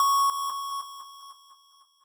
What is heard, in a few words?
lead